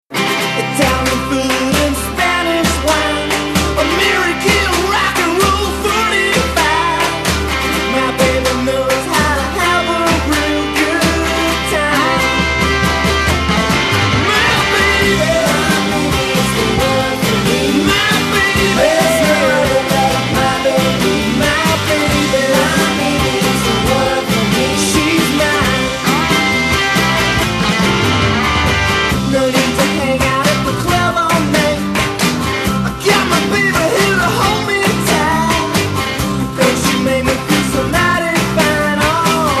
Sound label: redondo beat